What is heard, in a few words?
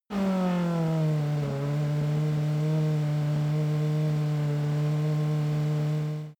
racing car f1 engine decelerate idle